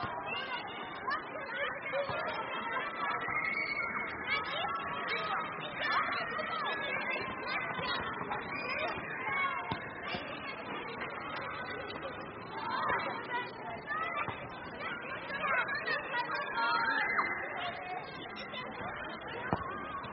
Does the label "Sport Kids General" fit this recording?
Yes